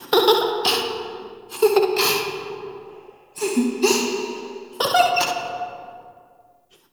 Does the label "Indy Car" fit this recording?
No